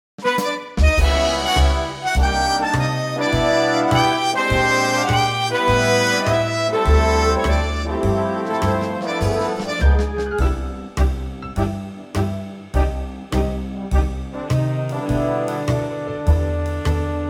Big Band G

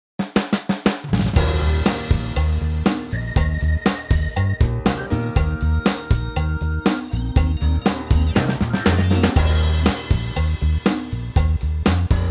Eb No Gtrs

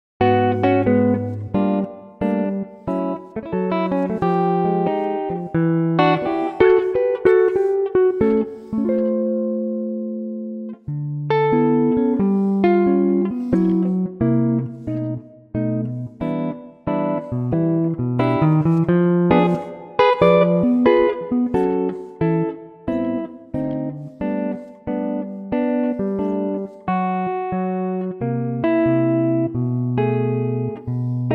D guitar; electric guitar; LP Guitar

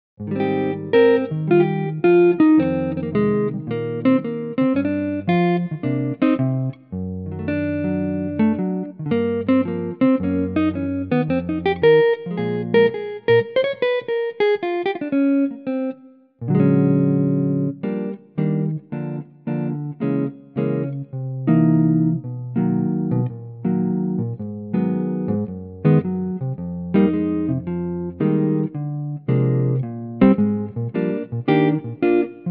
Eb Electric Gtr

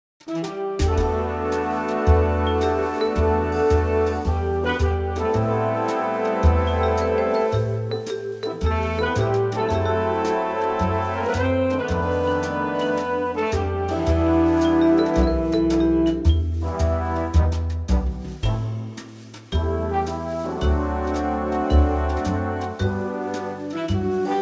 Em big band

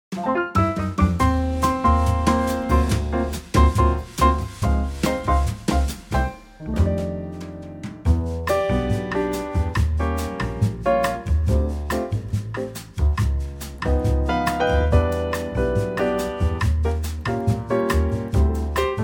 Trio C, male; vocal range - Bb to F

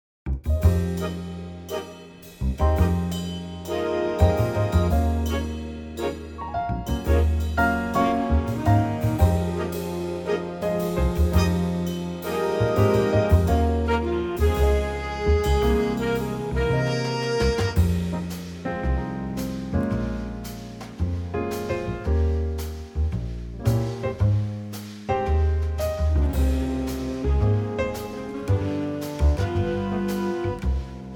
Big Band

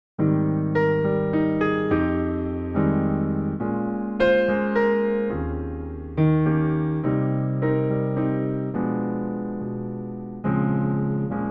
piano

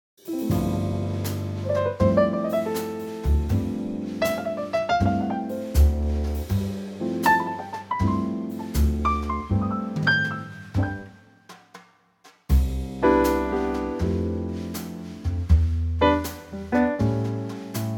C Trio

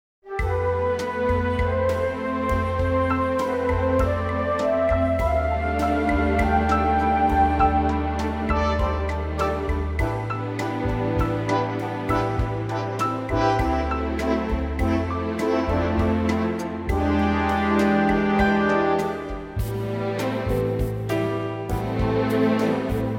Am Orchestral; key - Am - vocal range - A to G; Stunning orchestral arrangement

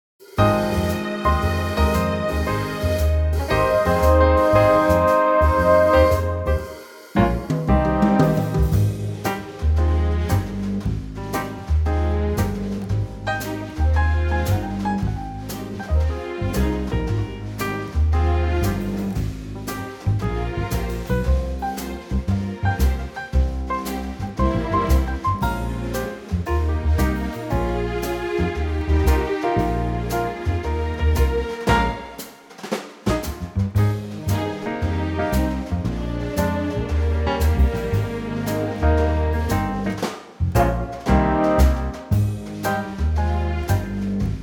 Ab orchestra

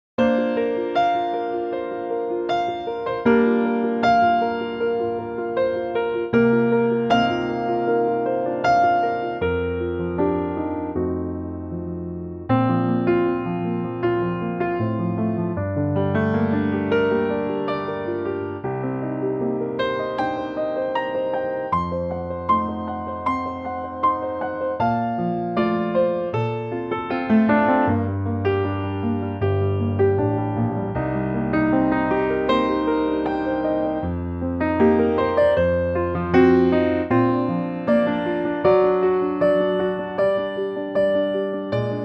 Bb piano

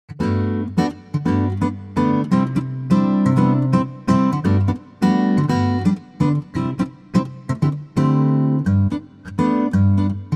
3/4 G acoustic guitar

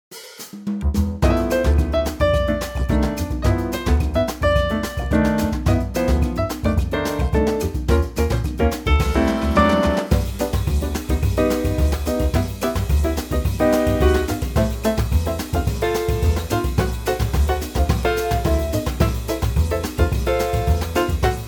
Latin Trio

